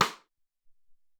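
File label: Metronome, Alesis SR-18; Metrnome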